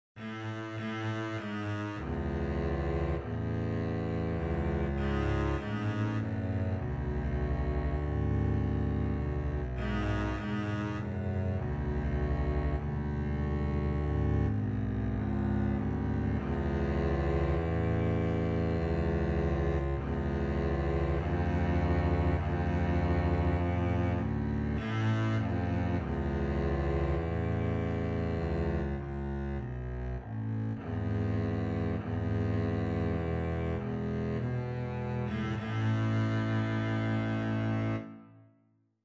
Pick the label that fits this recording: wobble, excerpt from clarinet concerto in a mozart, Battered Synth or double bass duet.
double bass duet